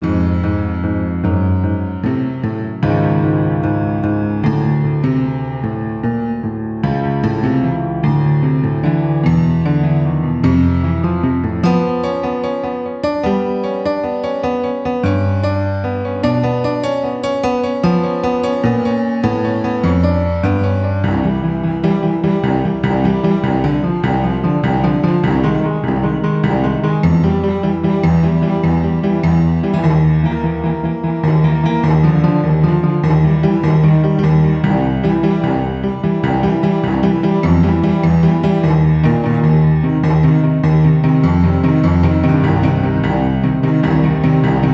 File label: Off kilter Bar-room feel Piano cello; CUES for Film TV Games etc SOLO PIANO